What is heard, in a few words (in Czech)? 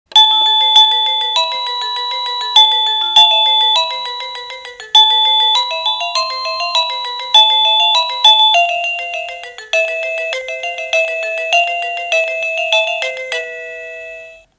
melodie